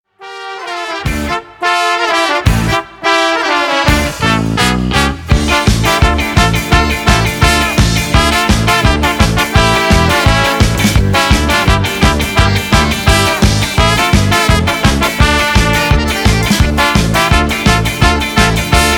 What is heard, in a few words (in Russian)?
veselaya narezka pod rington cheerful sound